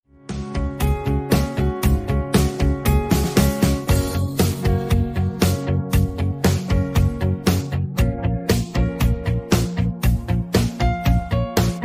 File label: instrumental